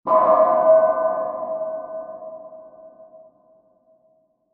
Mysterious Sci-Fi Blast; Blasts; Impacts; Sound Effects:Impacts; CraftedSignals

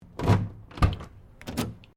CAR SUBARU LIBERTY EXTERIOR KEYS BOOT UNLOCK

